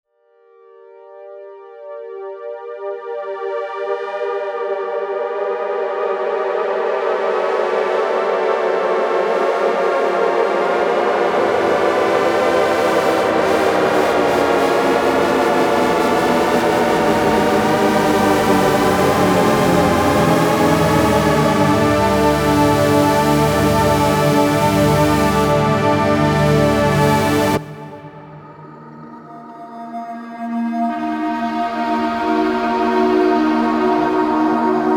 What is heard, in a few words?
cinematic synthesis